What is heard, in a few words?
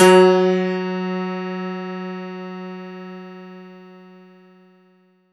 F#3, synth; 90 s Sample CDs; NiceBeats; SynthLine VOL-23